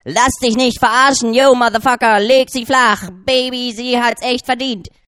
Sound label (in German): Lass Dich Nich Verarschen Rap